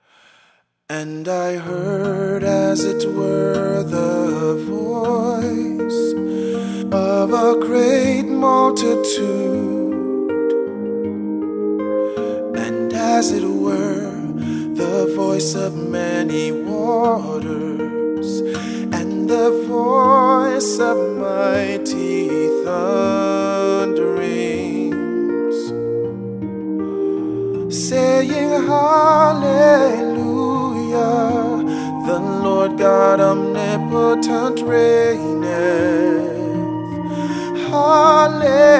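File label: electronic version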